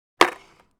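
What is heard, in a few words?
Skateboarding landing on a steel grind rail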